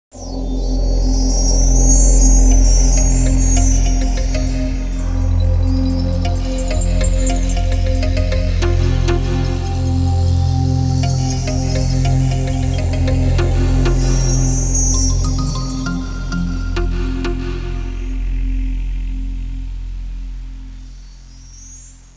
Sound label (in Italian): suonerie musica ritmica